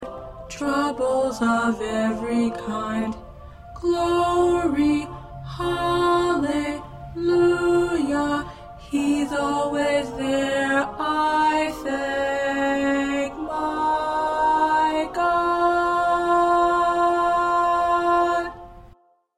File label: Tenor